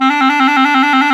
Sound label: WND TRILL, Clarinet FX; Roland LCDP04 Orchestral Winds; 90 s Sample CDs; Clarinets